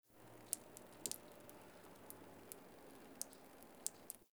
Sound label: Exterior Water Drip; Ambience; Warframe; Nineteen Ninety Nine